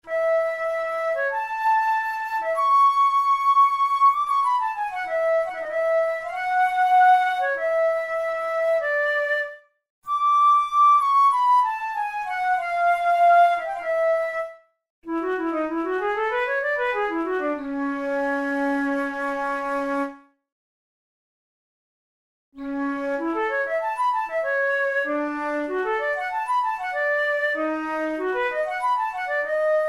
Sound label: studies for the boehm flute; drouet 72